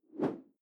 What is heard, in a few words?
whoosh simple short